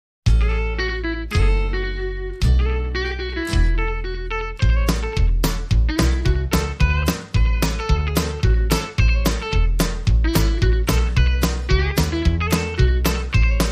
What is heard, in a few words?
Country Swing